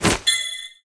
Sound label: solo multiplier Outro Banner; netent